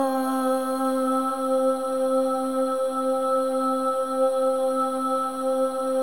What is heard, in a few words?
SOLO BOY, AH; Spectrasonics; Symphony of Voices